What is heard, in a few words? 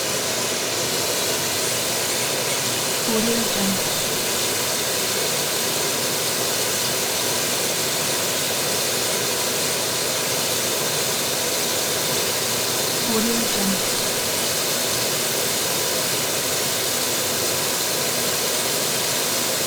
jet engine; blast drive 30; prosoundfx